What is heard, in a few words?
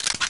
de clip out; cstrike; diliulsound